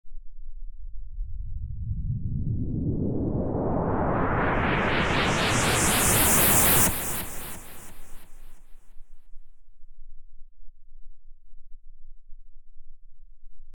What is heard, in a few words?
Pink Noise Effix Delayed; Techno